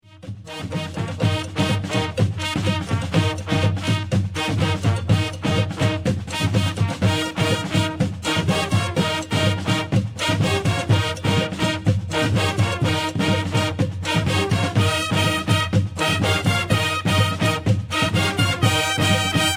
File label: techno